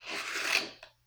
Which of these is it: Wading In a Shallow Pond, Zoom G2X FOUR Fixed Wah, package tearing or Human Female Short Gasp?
package tearing